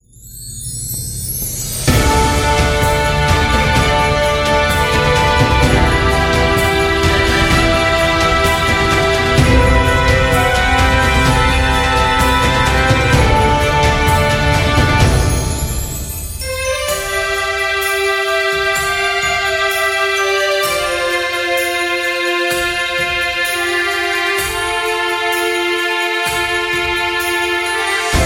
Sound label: Happy New Year Music; xmas